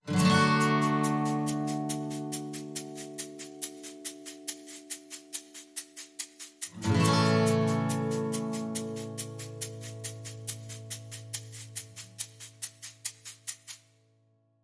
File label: Wonderful Moonlight